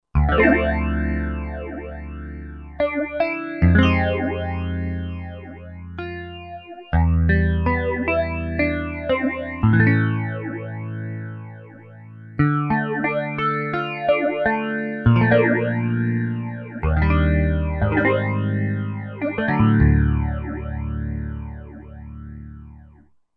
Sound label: Phase Gt, Roland SR-JV80-09; Roland SR-JV80-09 Session